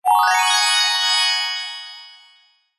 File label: fantasy magic glissando rise bell tree style fairy